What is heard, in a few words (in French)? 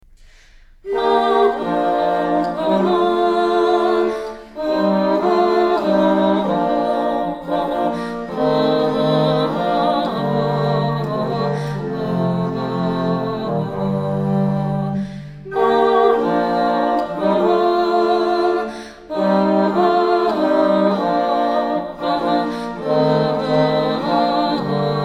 Sarabande faux bourdon voix graves